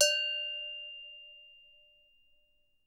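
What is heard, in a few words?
cow, right, Percussion; Miroslav Vitous - Percussion & Harp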